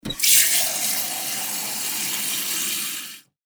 水を貯める

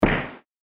打撃

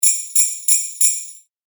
ジングルベル 4連続 響きなし

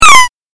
8bit キャンセル

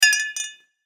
グラス乾杯

